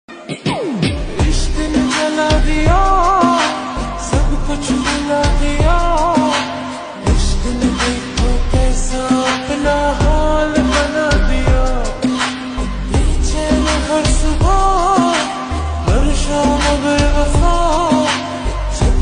lofi song ringtone love ringtone slowed reverb mobile phone ringtone